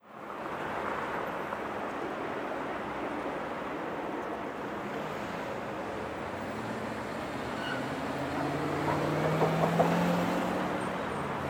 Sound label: Molokai Road